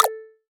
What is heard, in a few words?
crash; runner